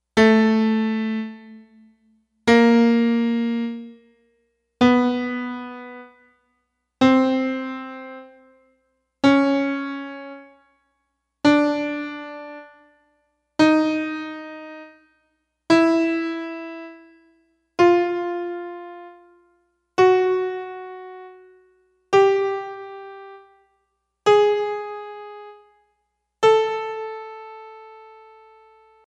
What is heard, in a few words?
piano